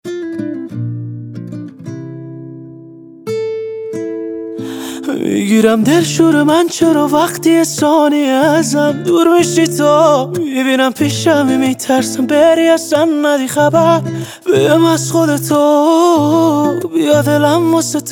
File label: guitar version